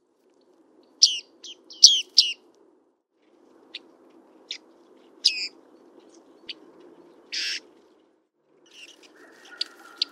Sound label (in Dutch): Baardman, vogelgeluiden; hdykstra